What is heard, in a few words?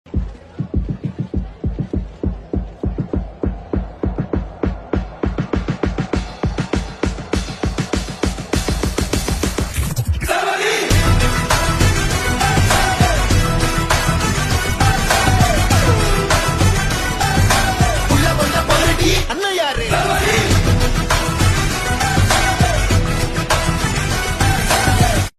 BGM ringtone; Leo